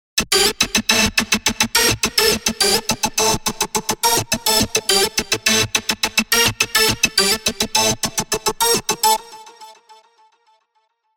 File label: Synth Loop A (105 BPM); Spire; Milkshake